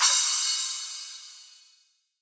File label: Crash; Cymatics; House Starter Pack; Cymbals